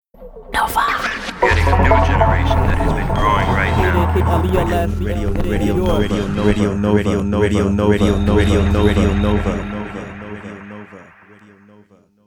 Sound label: Radio Nova Jingle; Nova 25 ans - 2001